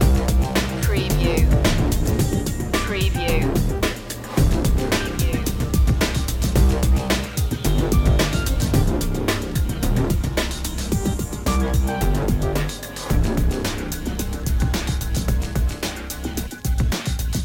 near the old quarry loop

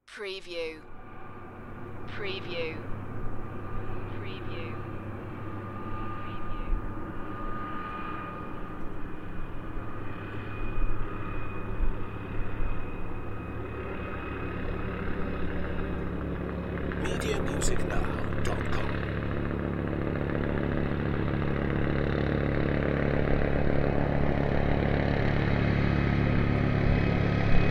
Helicopter flies by; beanstalk audio

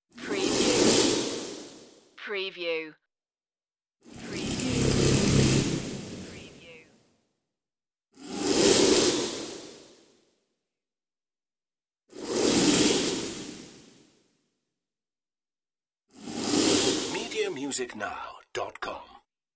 magic twist whooshes; Magic / Spells / Fantasy

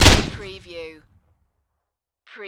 Grenade Close

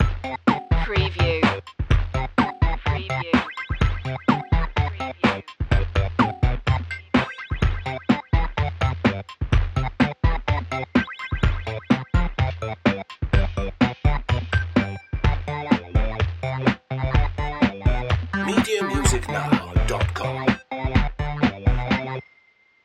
8-bit instincts loop

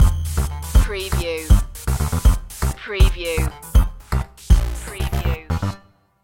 retro video game lazy world stinger

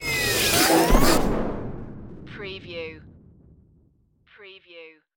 Bomb Explosion; sound-effects-bible

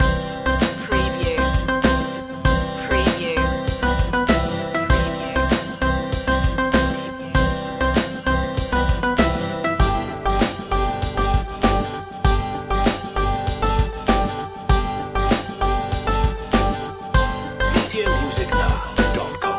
late night beach party loop

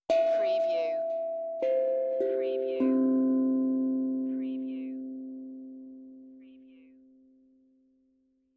Tongue Drum Meditative Tone; beanstalk audio

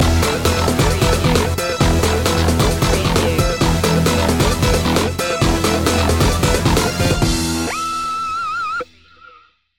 loop ending

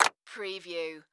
Clap Drum Sample

